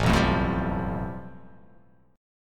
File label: A 7th Suspended 2nd Sharp 5th